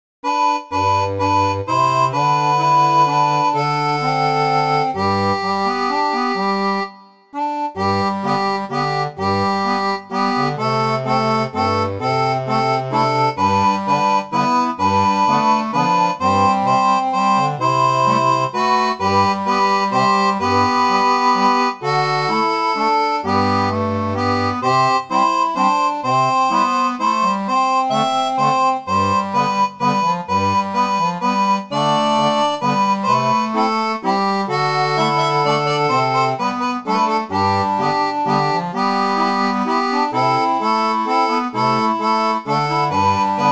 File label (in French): seraphone, orgue barbarie